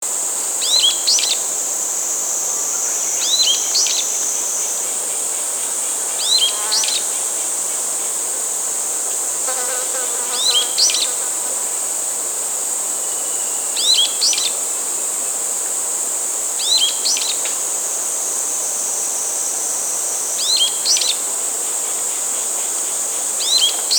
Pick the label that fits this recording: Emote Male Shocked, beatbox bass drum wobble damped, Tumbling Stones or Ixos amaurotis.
Ixos amaurotis